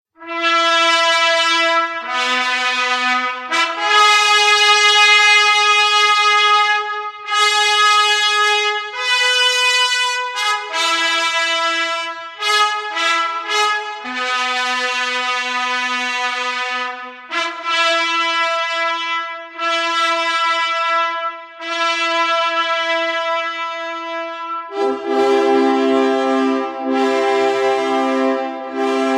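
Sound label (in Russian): Фанфары медленно торжественно; Чайковский; Итальянское каприччио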